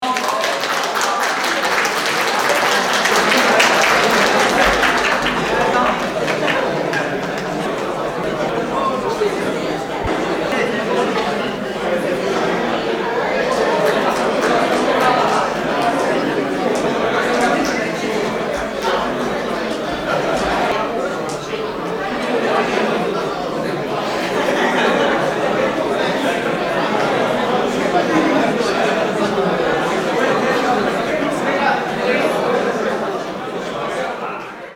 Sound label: party hubbub